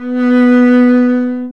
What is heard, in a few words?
STR, Cb Bowed; Roland L-CD702